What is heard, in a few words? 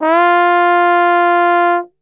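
F 3 trombone glissando, Brass Super Section; Best Service; TRMB GLISS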